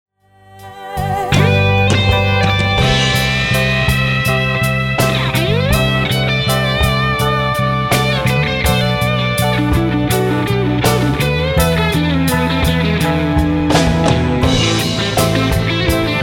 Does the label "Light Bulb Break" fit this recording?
No